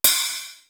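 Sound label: TYNI, cymbal; CYMB.HH; East West; Global Sounds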